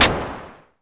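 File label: mbrute noise, Arturia MiniBrute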